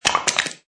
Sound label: Metal Can crunch; Metallic; Multimedia; Lynne Music